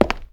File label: walk con, FootStep; WarRock